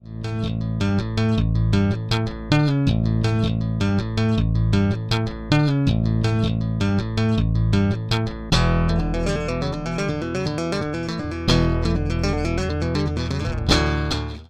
Original Bass

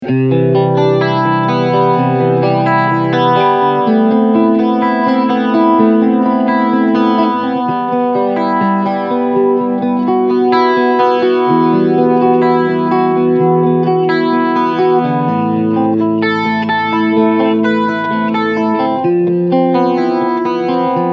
Medium Rate

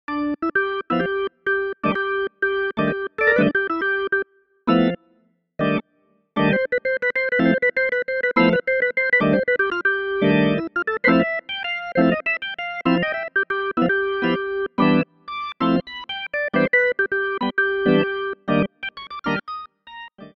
Jazz Full Bar

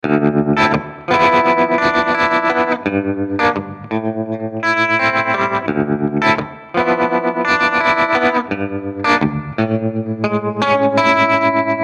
MF Trem Fast Tremolo

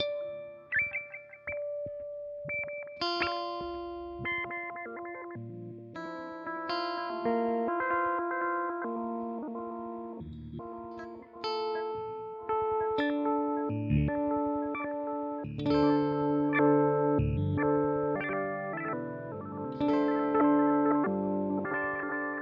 Thermae Step Intervalls EXPR; Chase Bliss Audio

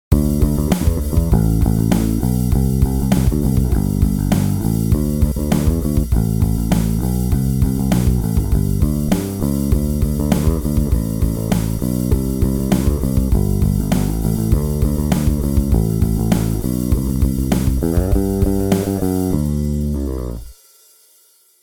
Neck PU

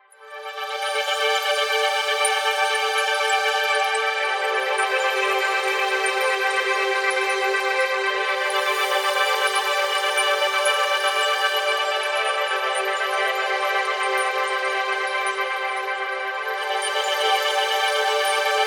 Rapid Stutter Pad; Parawave

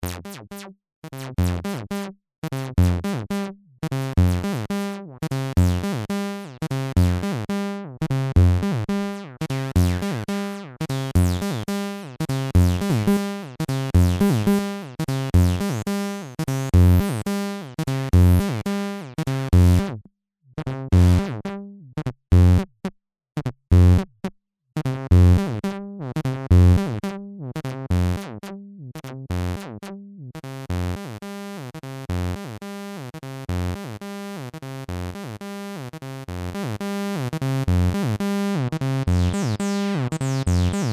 Filter FM with Overdrive

